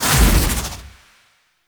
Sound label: Flight End; Fairy